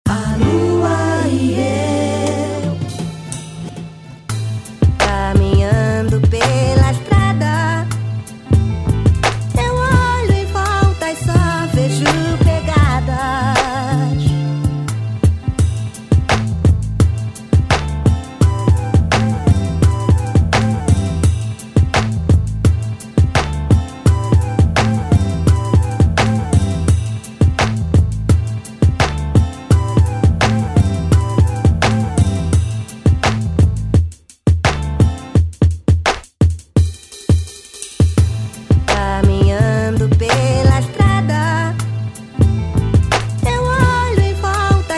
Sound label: beat